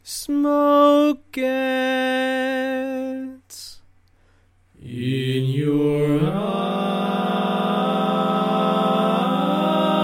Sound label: barbershop tags, all parts